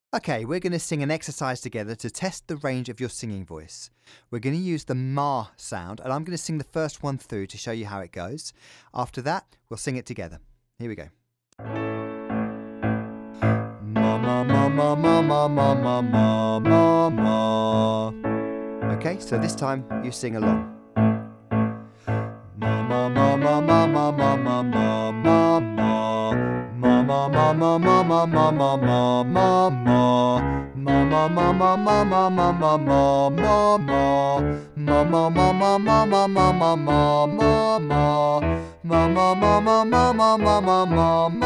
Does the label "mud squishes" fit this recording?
No